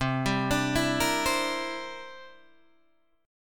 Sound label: C 9th C down Guitar Open D